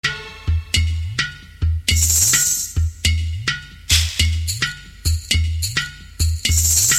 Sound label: funny music